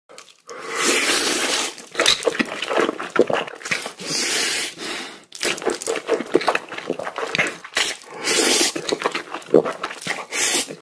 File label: Edible noodles